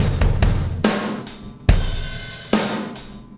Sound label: Impact Crater Beat, Processed Drumkits